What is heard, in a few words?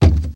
wall gate; Golden Crayon Sounds